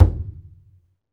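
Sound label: perc, skin drums; Roland LCDP14 Africa VOL-2; Af.Hand Drm2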